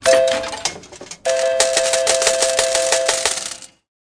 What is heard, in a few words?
slots; burns casino; moes